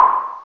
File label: SNR POP SN; AMG; Now CD-ROM (Roland); Snares